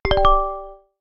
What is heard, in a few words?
Joy, notifications; Coolpad; Note-8